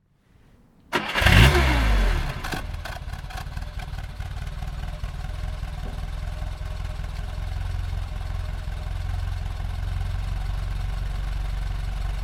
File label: Mercedes Benz 190